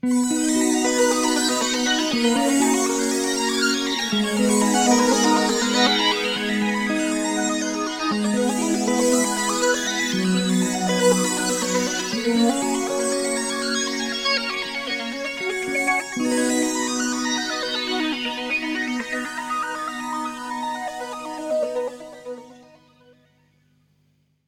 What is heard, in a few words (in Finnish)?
kitarasyna; guitarsynth